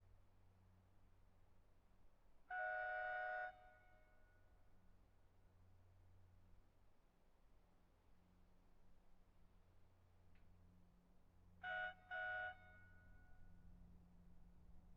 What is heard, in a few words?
Ford Crown Victoria, Horn Various Distant; 2007; Pole Position Production